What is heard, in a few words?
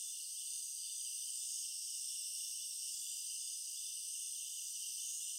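jungle loop; nature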